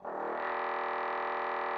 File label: trombone E1; Xsample VOL-3